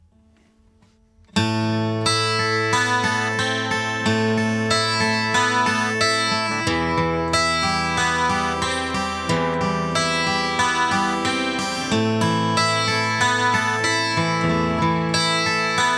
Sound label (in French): comp bourrin eq arpeges; arpèges de guitare enregistré avec la 8801; AMS-Neve